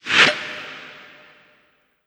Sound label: Sweeps Short